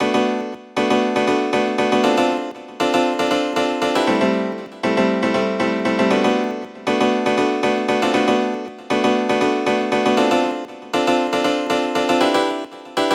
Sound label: 118, Bmin, Chord Stabs; Solution